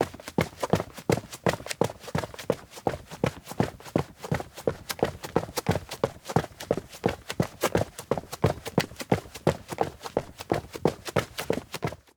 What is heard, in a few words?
steps outdoor road run; anemoi